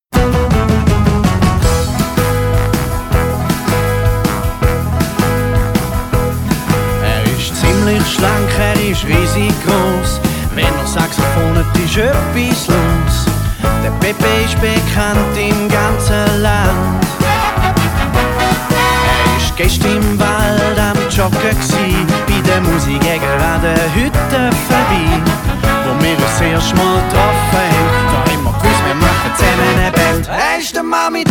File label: saxophon kurz